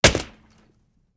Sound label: windshield hit with bar